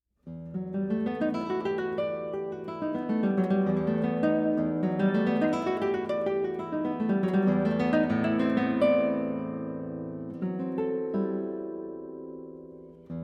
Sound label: Guitar Solo